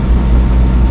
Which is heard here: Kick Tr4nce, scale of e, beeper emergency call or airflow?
airflow